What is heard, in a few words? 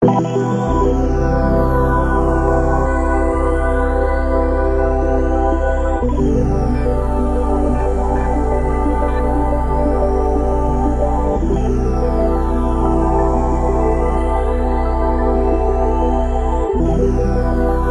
beats